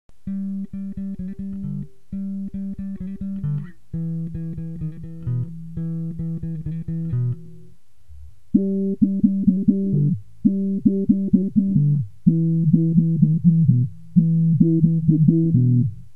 wahwah, bass

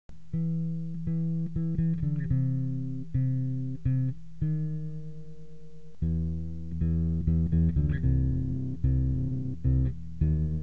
octaver, bass

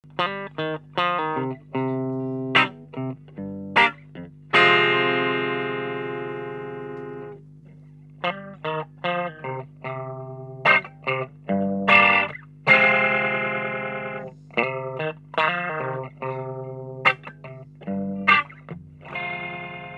Rotary

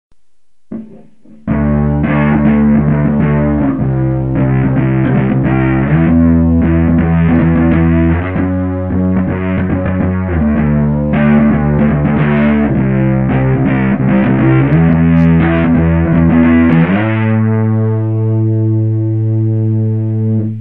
Disto

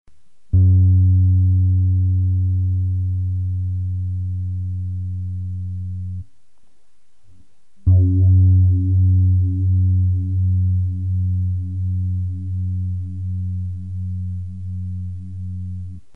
phaser, bass

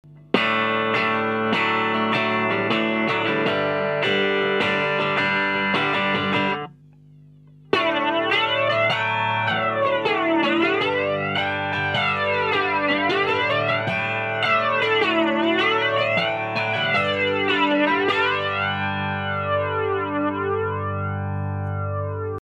Flanger

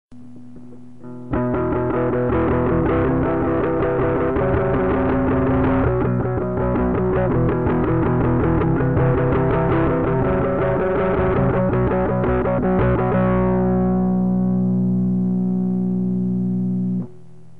Overdrive, Effets

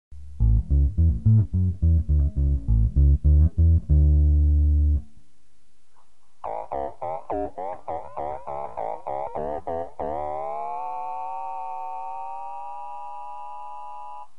ring, bass

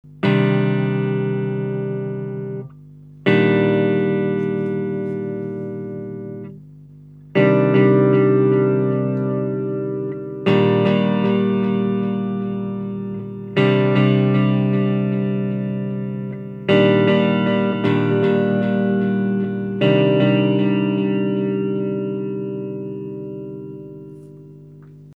Delay, Effets

